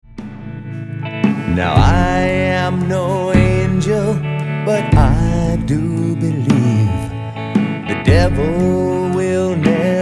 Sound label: Blue Caribbean Waltz